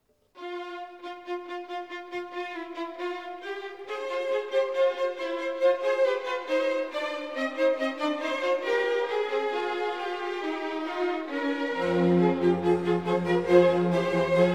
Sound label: Concerto grosso in F minor, Allegro; Pergolese